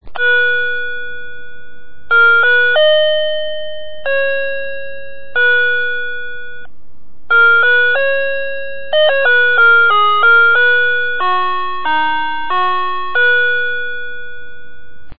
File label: chime, quartz clock